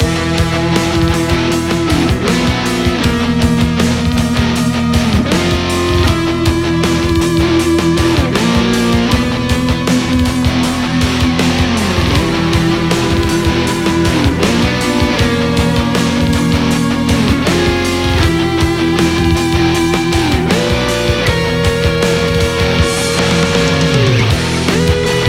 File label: music boss